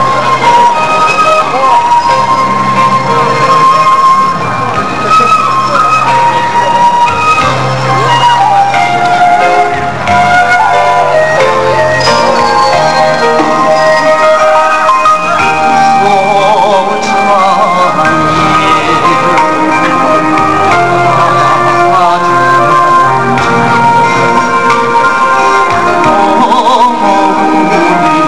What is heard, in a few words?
ditan karaoke; china